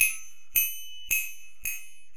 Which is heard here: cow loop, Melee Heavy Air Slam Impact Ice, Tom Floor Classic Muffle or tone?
cow loop